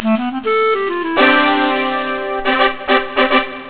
Clarinet horns; Aldus PageMaker 5.0 French

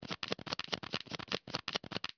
CARDSHUF; Infobase

